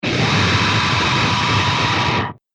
Scream Total Distortion; Screaming Sound Effects

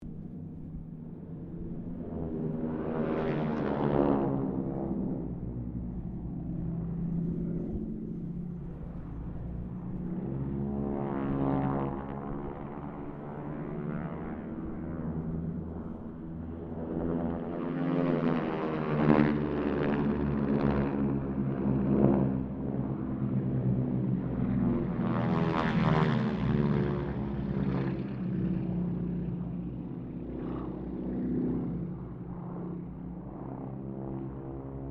Harvard squadron 4 pass, stereo; Mixed aircraft squadrons; Airplane; Airplanes; Vehicles

